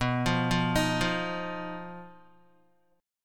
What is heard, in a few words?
B Major Flat 5th B Guitar; BMb5; arpeggiated; 6,8,5,8,5,x